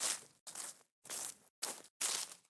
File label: Footsteps Grass fast; BLIND QUEST - The Enchanted Castle